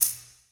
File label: shaker hi; Percussion; Sonatina Symphonic Orchestra